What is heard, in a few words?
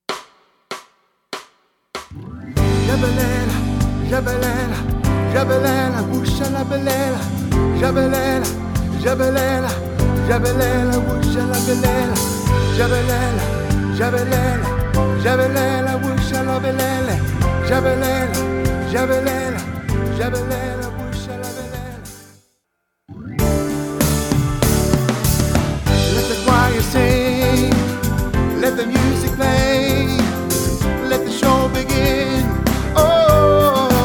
Let the choir sing Tenor